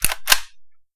m16a2 charge, weapons; garrysmod

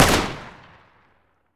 famas fire, weapons; garrysmod

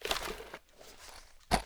vollmer box out; mg; garrysmod

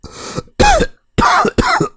cough; garrysmod

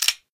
fiveseven slide back, weapons; garrysmod

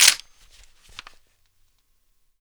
m3s90 bolt catch, shotgun; weapons; garrysmod; m3s90p

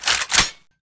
bolt pull; garrysmod

